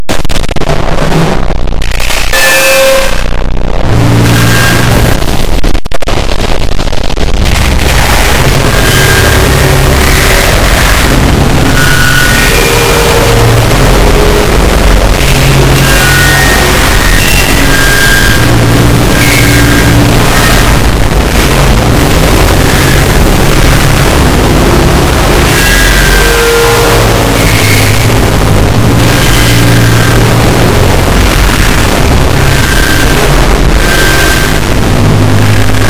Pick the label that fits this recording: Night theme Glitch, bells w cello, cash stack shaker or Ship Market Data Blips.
bells w cello